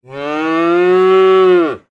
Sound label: cow sound